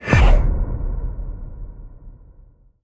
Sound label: ghost pool; netent